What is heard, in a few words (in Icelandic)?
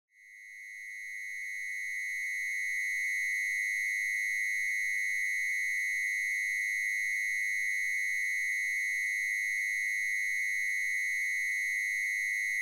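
viðvörunarhljóð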